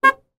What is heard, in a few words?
transport car horn beep accidental weak